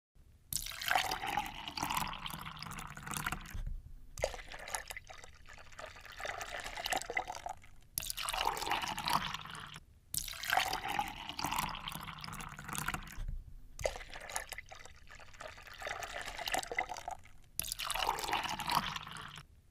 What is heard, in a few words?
Pouring Milk Sound Effect